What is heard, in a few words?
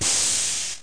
STEAM